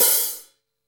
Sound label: HH, Kits, Rev